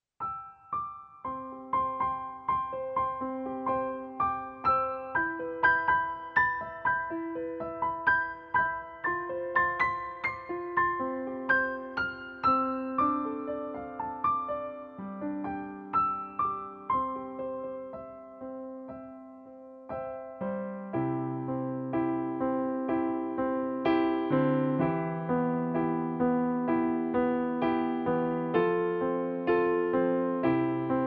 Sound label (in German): Playback C-Dur